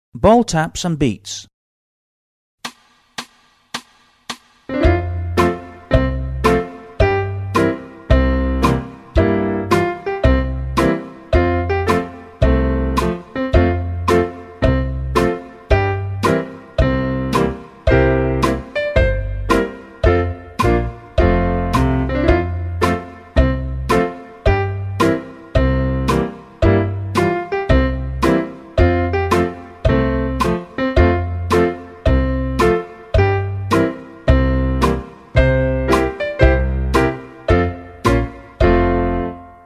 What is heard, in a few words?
Ball Taps Beats, tap exam pre primary